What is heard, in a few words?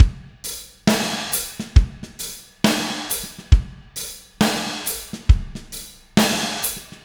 Drums, Indie Rock Anthems; Sonic Foundry (Sony Creative Software); Session 04 - Dubwyzz